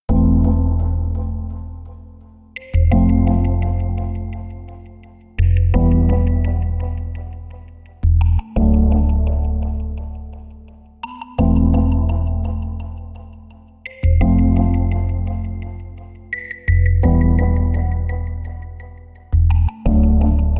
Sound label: House & Armor Shop Track; Pixel Boy and the Ever Expanding Dungeon